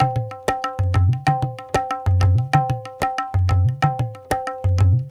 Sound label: Tablas